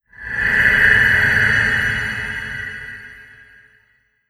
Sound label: Gargoyle Pass Fear; Legend of Keepers (gamerip) (2019)